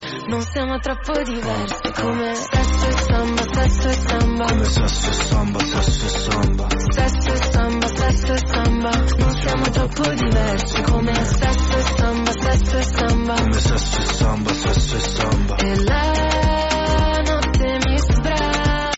samba

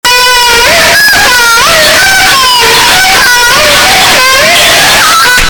screaming women troll

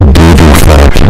the doo doo fart button

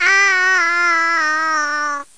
awwwww

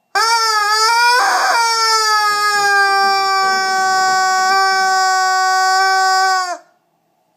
rubber chicken screaming

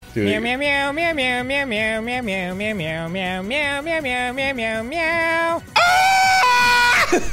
moew moew moew moew meow moew moew

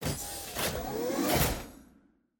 scifi door opening

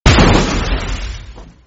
car sound impact

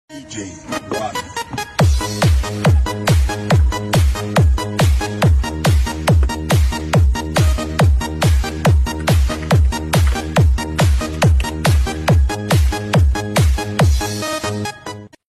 viral beats

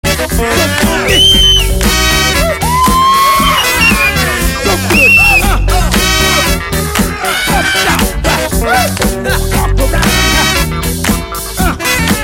scream

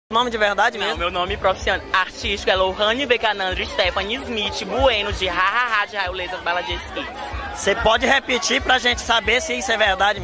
ha ha ha; bala de icekiss

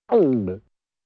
nervous gulp

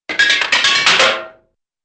fast metallic hits

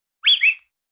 yoo hoo type whistle

